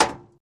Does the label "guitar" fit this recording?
No